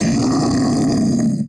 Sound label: Ogre Death